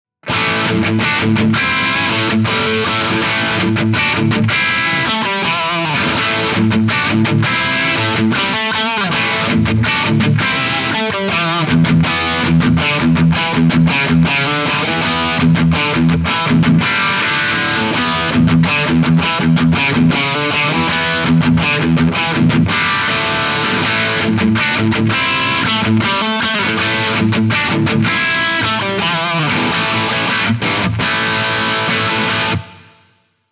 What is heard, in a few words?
characteristic sound; jensentone